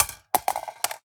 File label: phys mag drop ar poly concrete, magazine drops